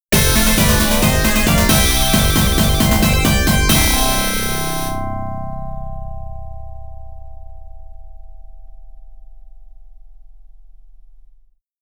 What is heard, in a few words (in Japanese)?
ゲームオーバー